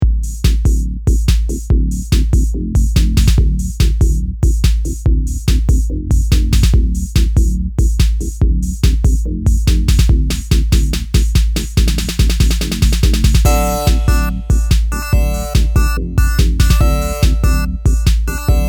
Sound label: Dub Siren